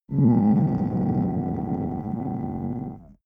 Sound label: stomach growl; soundpool